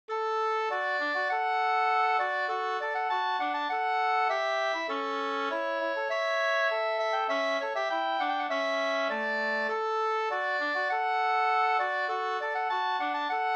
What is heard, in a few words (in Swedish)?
ganglat, 2st; fr Falsterbo bruk Sm